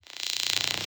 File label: Glitch